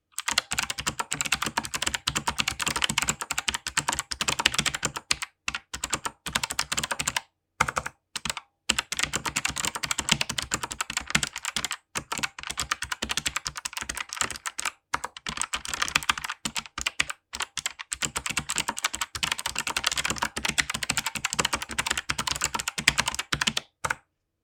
Schreiben

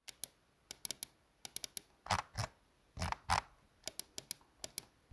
Mausrad klicks und scroll

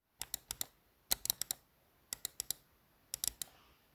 Mausklicks rechts

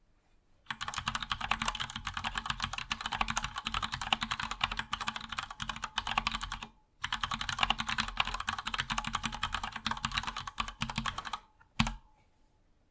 Ducke One 2 Skyline Schreiben

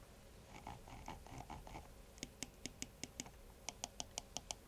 Scrollrad DPI

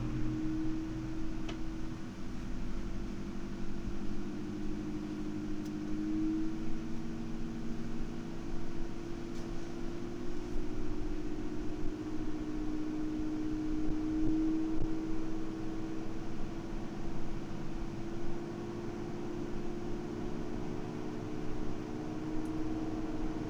Langzeit 25mm Radiator

